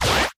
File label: fs Boss Sign Spin; netent